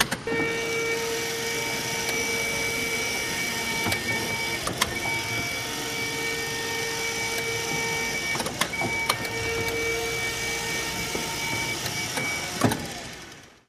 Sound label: Elect Wheelchair, wheelchair